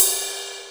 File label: SR16 Ride, Alesis Sr16; Drums